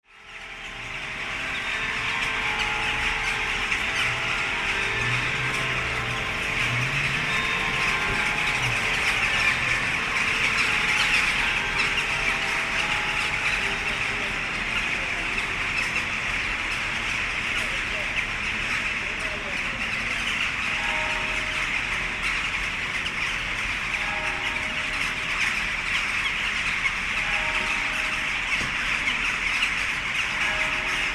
Linkoping Jackdaws

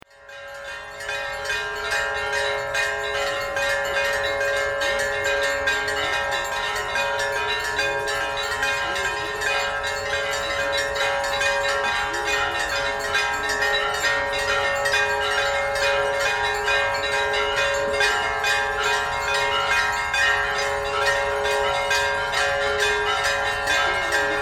dash ghat, india

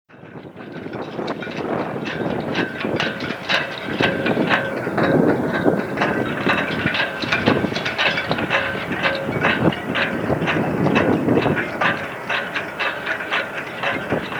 Mudeford Quay Masts

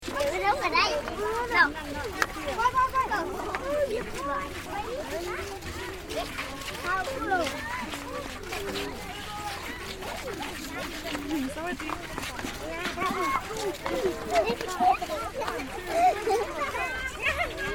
walk after school, laos; ...in / the company of several hundred fascinated school children, in bucolic / Muong Houn.; walking home from school

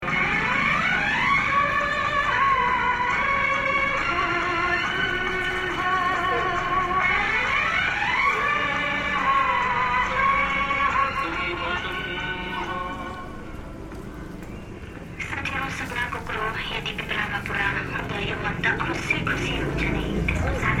blown cone

